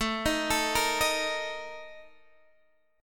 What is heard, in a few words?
A sharp Major 7th Suspended 4th A